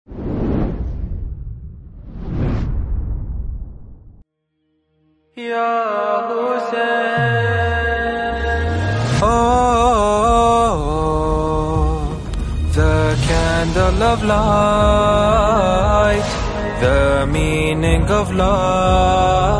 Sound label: Candle of Light, English Urdu Latmiyya, Nohay; Muharram 2022; New Nohay 2022